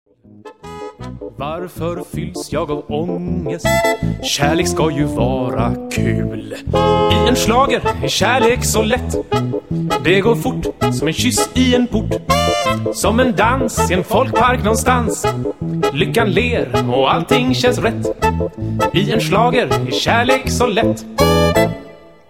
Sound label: schlager